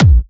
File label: Kick 140BPM, Drums & Perc; Vengeance Samples; Incast Kit; E minor